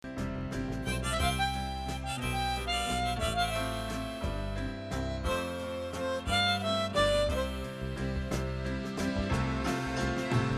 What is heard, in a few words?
harp C